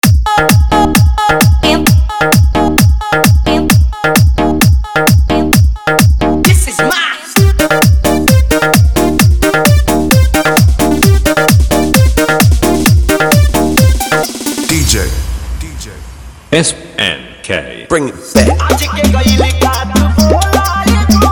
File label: Bhojpuri Dance